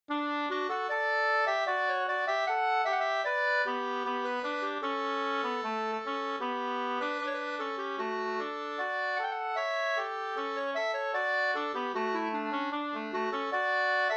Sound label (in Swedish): skänklåt